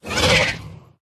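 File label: riding lizard block; ezdovoy yasher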